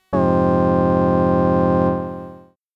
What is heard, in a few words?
Piano Chord; CRAFTsynth 2.0